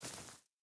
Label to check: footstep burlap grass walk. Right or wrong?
Right